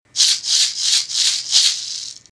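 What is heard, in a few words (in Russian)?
шейкер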